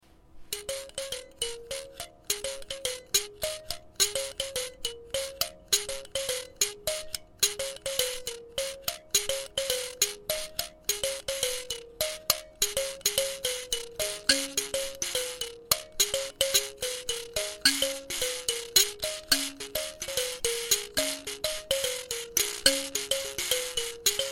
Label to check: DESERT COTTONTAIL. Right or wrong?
Wrong